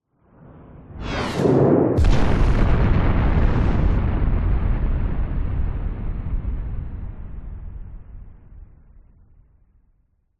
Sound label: warfare missile incoming whizz by then explosion